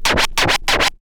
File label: scratch short, Vinyl scratches